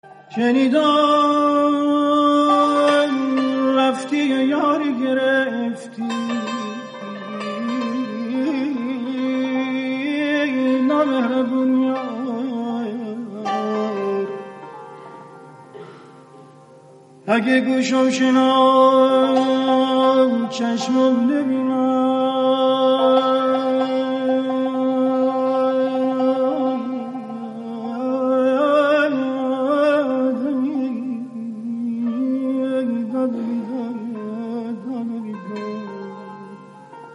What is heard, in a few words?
Saz o Avaz e Dashtestani